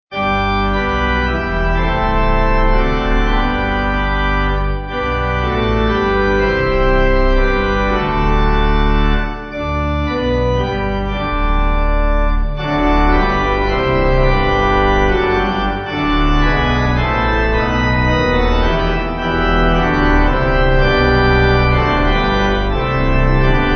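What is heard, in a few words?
Pipe, Pipe Organ backing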